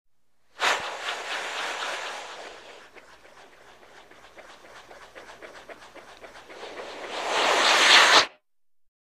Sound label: Football Flying